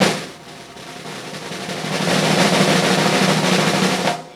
JAZZ ROLL, JAZZ DRUMSET

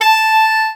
Alto Sax Portato A4; Orchestral; VarazdinOrchestral

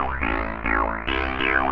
TEKNO 140, Techno; Techno2; Sphere

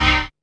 dance chord

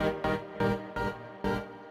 SYNTH INDST 125 A, Industrial, Keys

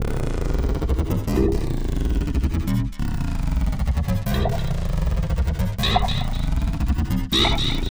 Atmos FX, Atmosphere & FX; Loops; Touch Loops; BlissedOutBeats

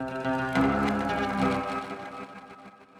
ethno, 160, C

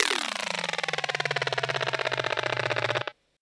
Industrial 140

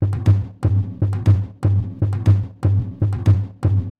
120 Beats, Drum Loops

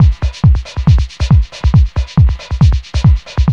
LOOP 138; 122-146 BPM

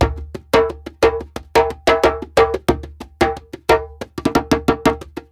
ETHNO PERCUSSION 90; ethno2

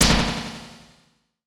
Perc; OrganicTopLoopsMini; Touch Loops

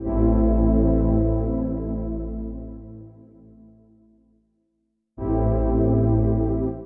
techno pad 140; Techno2; Keys

